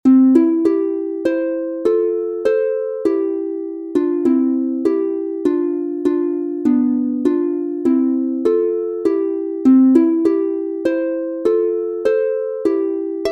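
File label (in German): C Dur 2 stimmig instrumental, Harfe; Soldaten und Marschlieder; Wohlan die Zeit ist kommen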